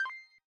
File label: count Up End; netent; dracula